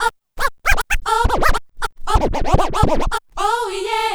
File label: Scratch